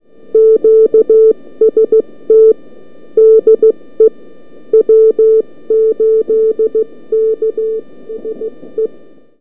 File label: 40, arrl fmt; arrl fmt april 2011